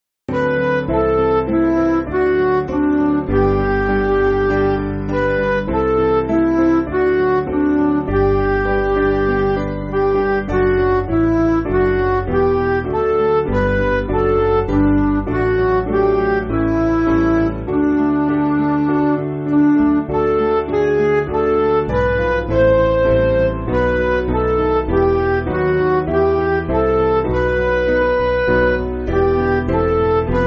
Midi

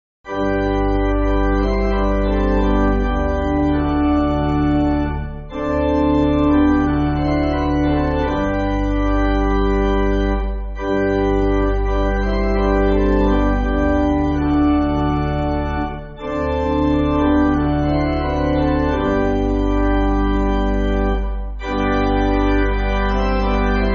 Pipe, church music

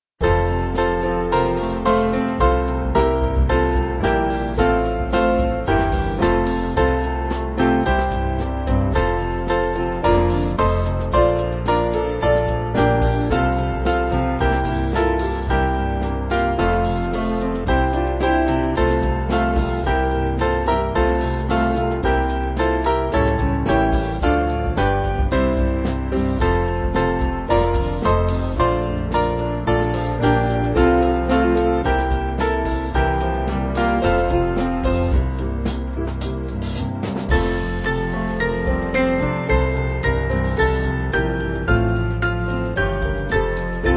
Band; God Who Stretched; Hymn To Joy

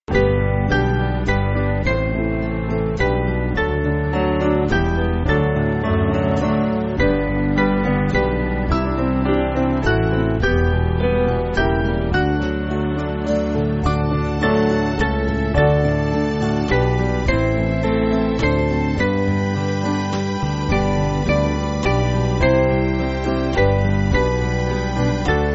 Band, church music; The Death Of Jesus; Gottlob Es Geht

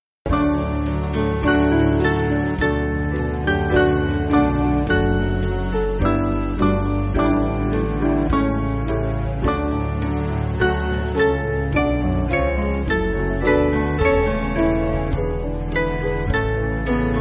Band, Backing Files for Churches; Thee We Adore; Pax Dei

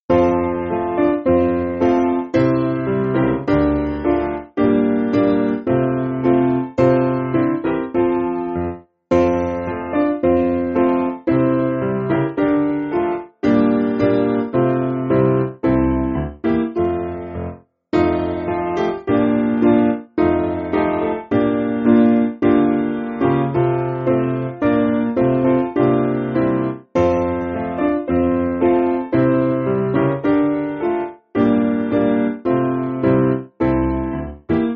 SPiano

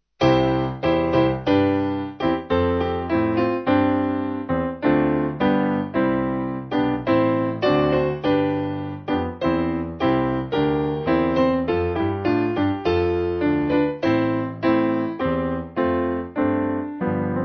Piano

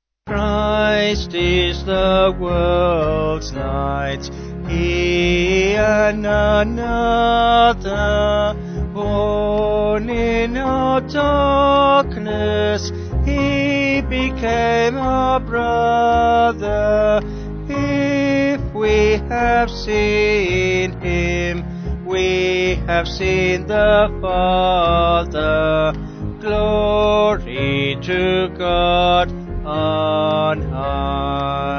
Vocals Band

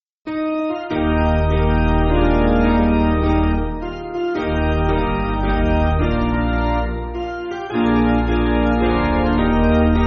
Kirkpatrick, Piano; Hear the footsteps of Jesus; Basic Piano & Organ (CM)

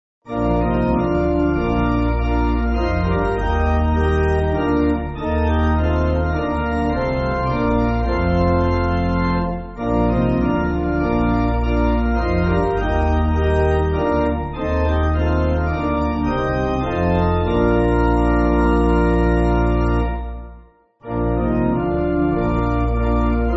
Pipe, church music; Love Is A Life Giving Water; Thompson

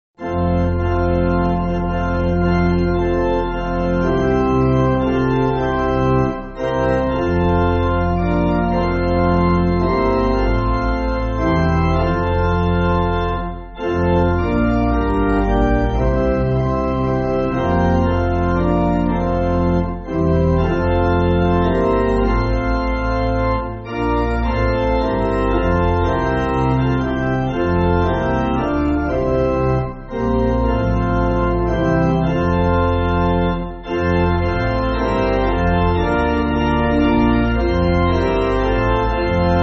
Pipe, church music; Friends Are Outside; Loharano

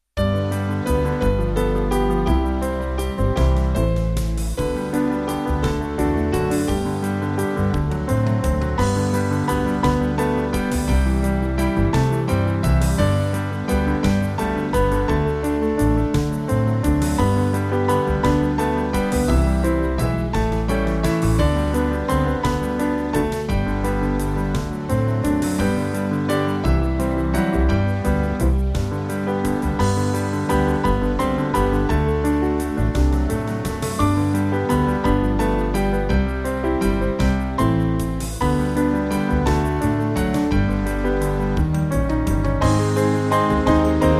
Chorus Band; Yesterday Today

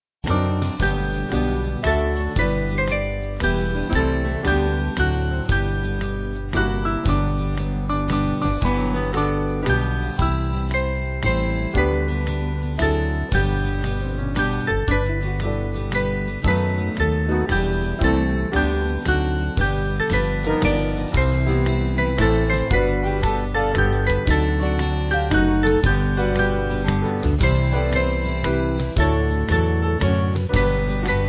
Band; All Hail The Power; Diadem

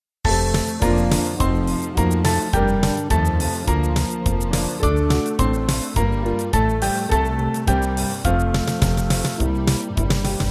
Band; Christ Whose Glory; Lux Prima

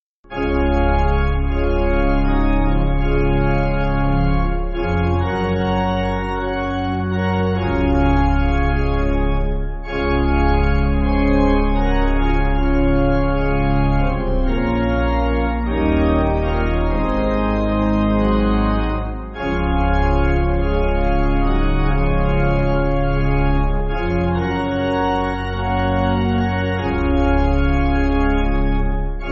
Pipe, church music

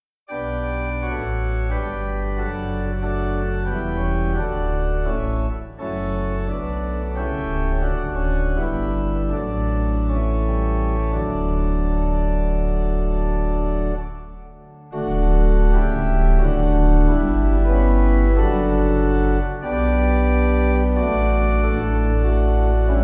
Author Of Life, Gweedore, Pipe

